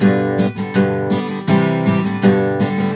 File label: GTR, Celtic Flavours; USB Soundscan vol.42; AKAI